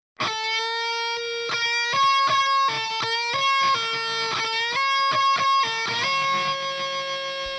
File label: 8 Second Guitar Riff; Metal; Alternate - Experimental - Progressive